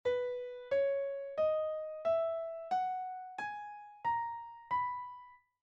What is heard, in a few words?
B major scale